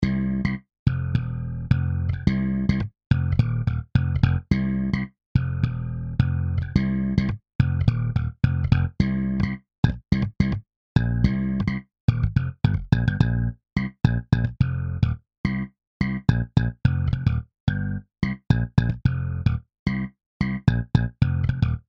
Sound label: Transition Practice Playalong Bass; Rhythm Bootcamp